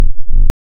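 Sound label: waveform FM triangle sine silence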